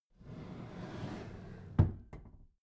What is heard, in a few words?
packed drawer smooth clatter close soft